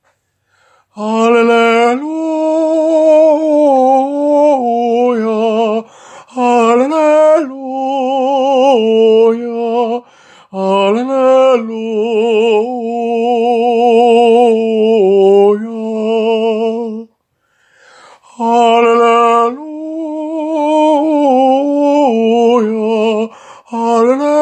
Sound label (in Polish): Alleluja TENOR